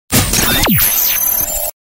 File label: Galery Noise; Sound Ideas - Galery Noise 002